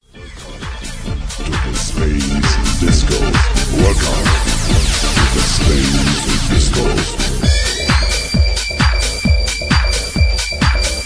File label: Space Disco